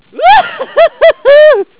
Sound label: sick laug